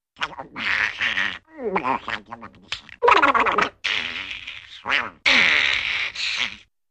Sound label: Duck Gargling